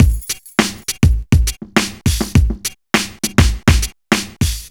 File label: clicked, breaks